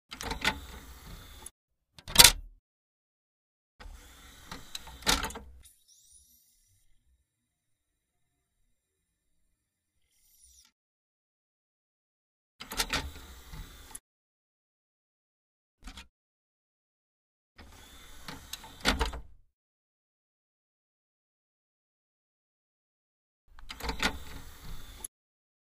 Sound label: Opening, closing the CD-DVD; computer